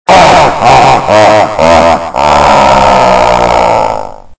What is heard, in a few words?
evil laugh